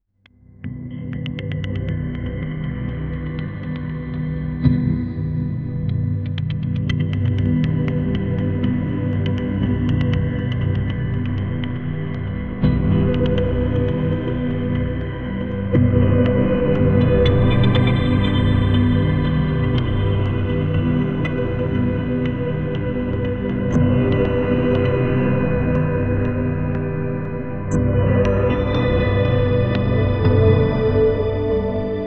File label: a dark ambient synth scor; drone